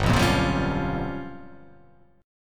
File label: G 7th Sharp 9th Flat 5th